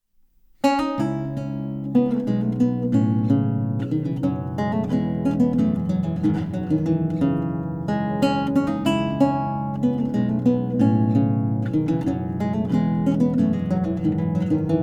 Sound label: Hornpipe; Purcell